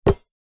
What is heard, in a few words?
sport basketball catch two hands; zapsplat